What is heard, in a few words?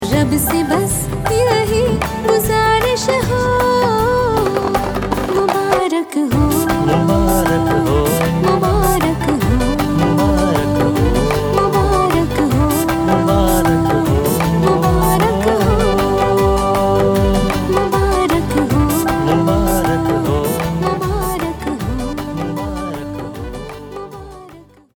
CONGRATS FEMALE HINDI